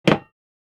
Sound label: Cooking pan sizzle put down on metal hob